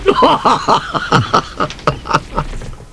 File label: laugh